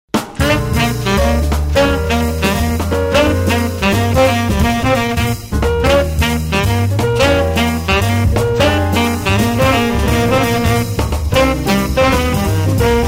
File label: Tenor